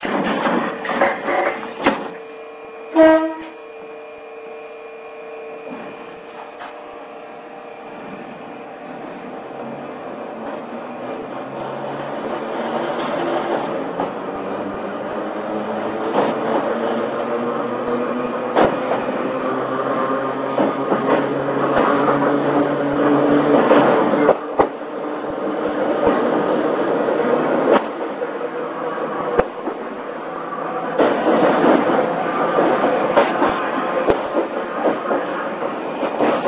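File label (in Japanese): 蒲原鉄道モハ41形 村松駅出発時の走行音; 村松駅出発時の走行音（1998年10月3日収録）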